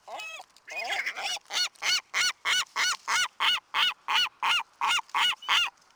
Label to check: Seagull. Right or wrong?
Right